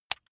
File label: electric button drill direction switch lock heavy